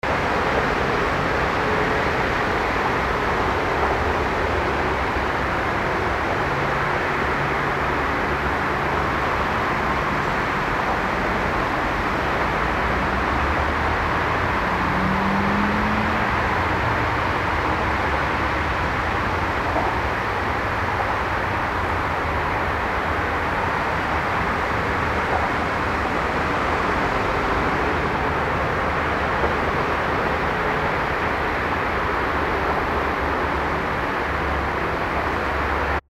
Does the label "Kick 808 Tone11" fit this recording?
No